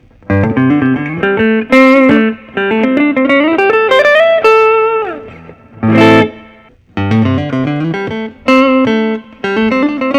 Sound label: PRS private stock custom 22 mocha